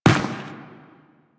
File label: Step Dirt, Footsteps; Enemies; Warframe; Teshin; DE